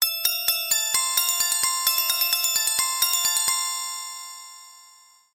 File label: tin tin tin music mobile phone ringtone